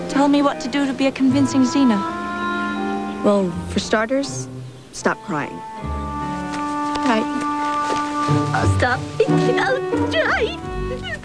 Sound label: crying